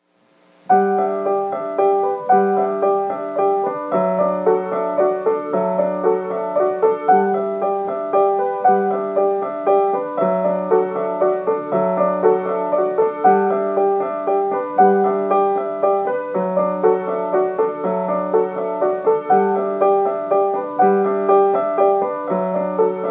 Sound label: Rain Waltz, Instrumental